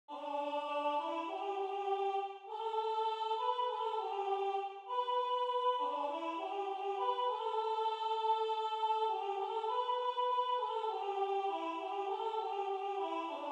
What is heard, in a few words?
Soprano